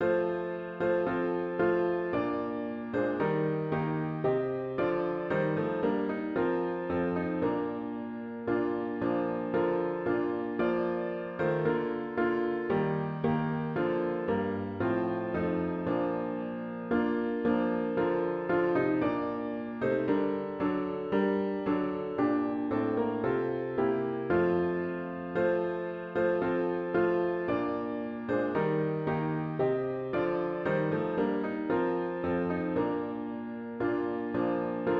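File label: Hymn piano with Intro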